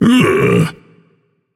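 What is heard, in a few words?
non-verbal ugh; smite; atlas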